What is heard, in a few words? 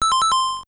Paused, Lock Chime; Mario